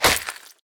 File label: limb slash; Damage; Barotrauma; Subsurface